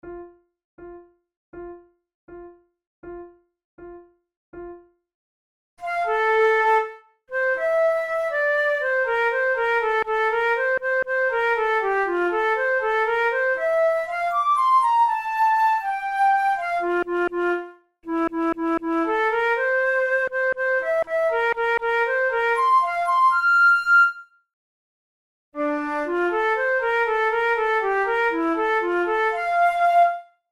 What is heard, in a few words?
camp song of the chicago irish brigade duet, flute